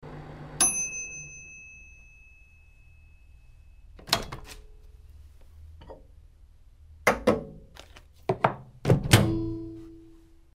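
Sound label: microwave sounds; zvuki mikrovolnovki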